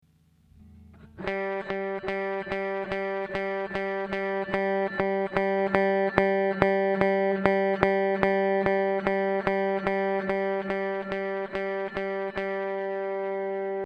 FMR PBC 6A Attack Low High Low

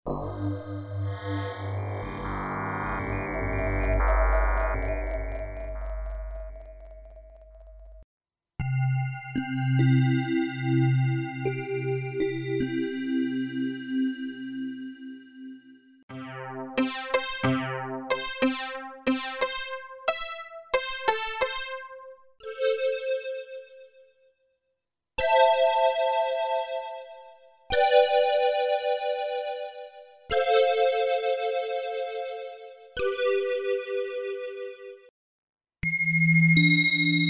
Emax Spectrum Synth

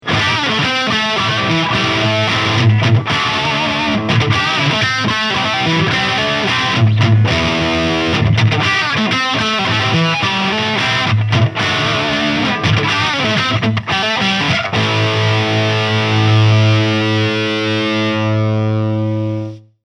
Gibson Les Paul Standard 60s Faded Lead

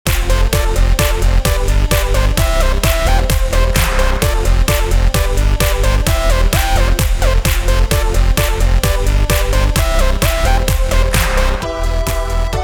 Juno DS88 Instant EDM

